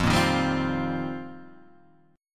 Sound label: F 7th Suspended 2nd Suspended 4th F